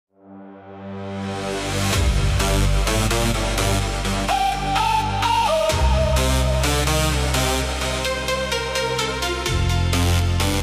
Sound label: synth pop modern disco style